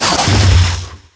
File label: crocodile attack; DAoC